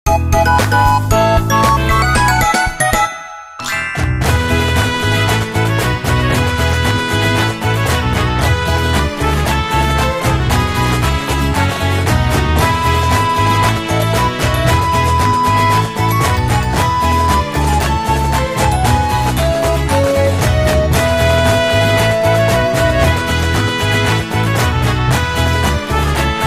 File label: Blast Clear Bgm; Bubs Puzzle Blast; Android